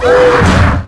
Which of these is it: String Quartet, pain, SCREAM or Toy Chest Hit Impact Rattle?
pain